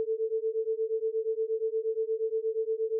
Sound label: Autogenerated sine 120 cents